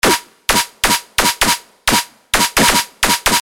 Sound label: Snare, C4, Trash; Prototype